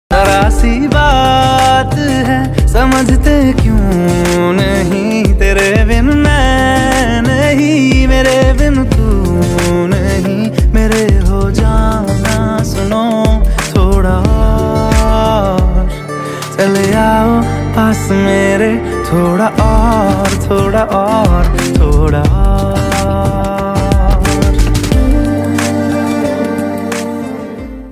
New Romantic Song Ringtone

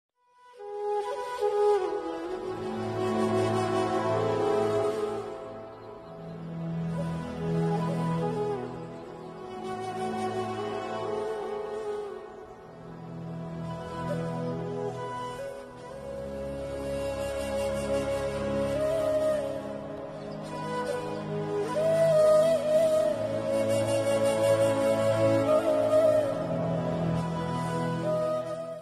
Sad Flute Ringtone

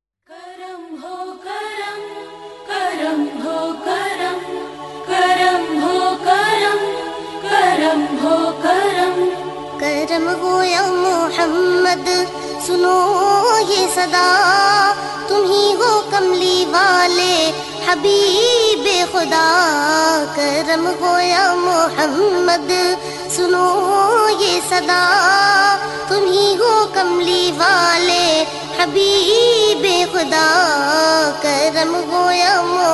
New Naat Ringtone

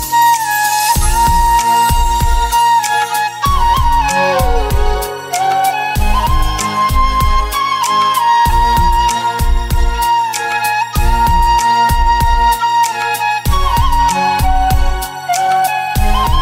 Flute Ringtone

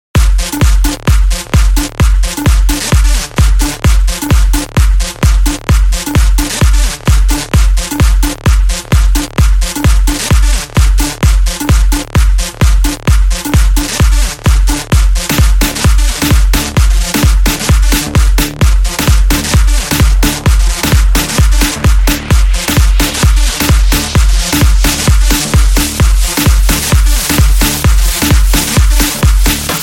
Remix Ringtone

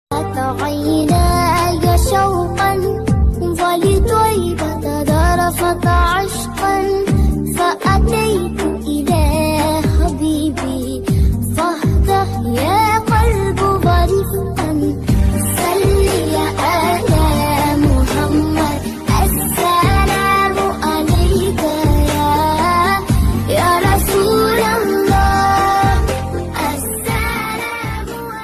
New Arabic Islamic Ringtone; ringtones

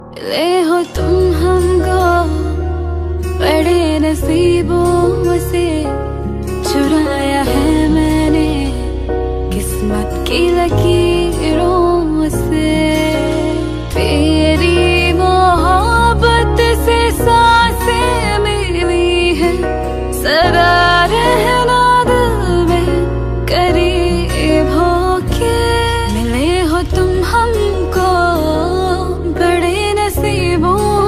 Sad Song Ringtone

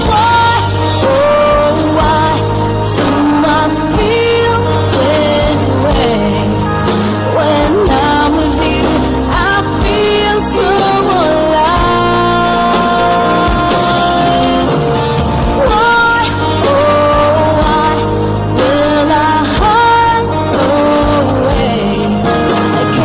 Falling In Love Ringtone

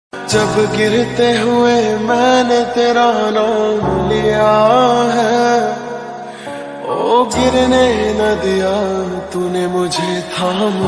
Bhakti Ringtone

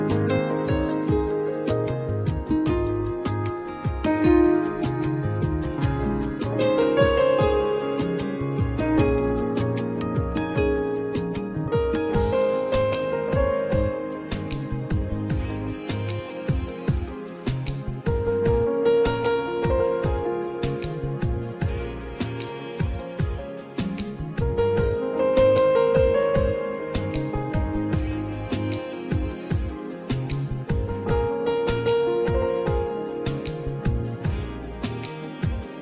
Piano Instrumental Ringtone

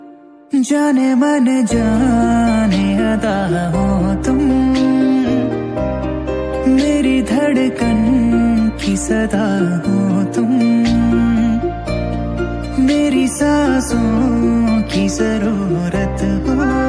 Hindi love Song; ringtones

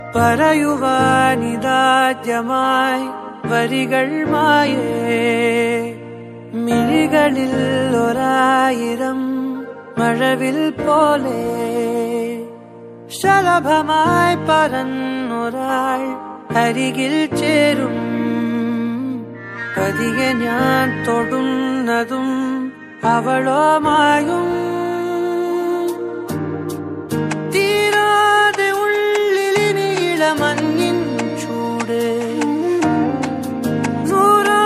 Malayalam Song Ringtone; Ishq Malayalam Movie

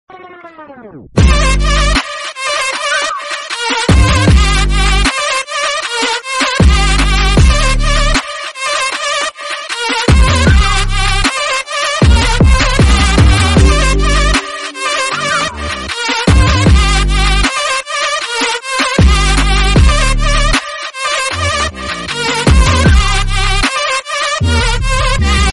Bgm Drill; ringtones; Jawan

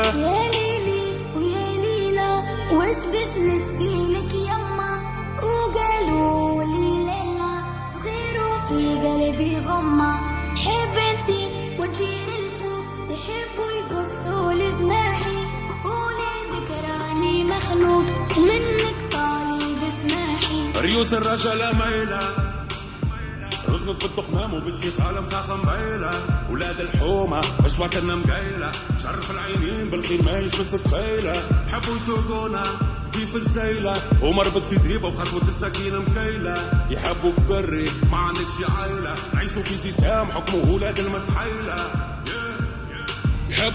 Remix Arabic Song Ringtone

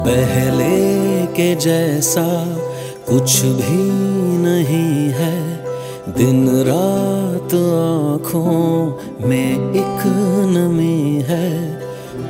Hindi Sad Song Ringtone

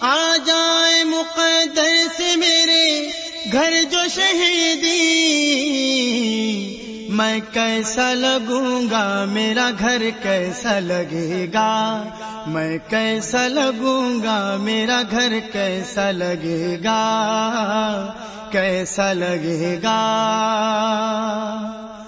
Naat Ringtone

